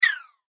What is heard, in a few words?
multimedia max min interface sound whistle min; Multimedia Interface ' Minimise ' Sound ( Whistle Style Tone ) Ideal For Minimising Of Bro; Tones (Sound Effects:Sound Design); McKinney Sound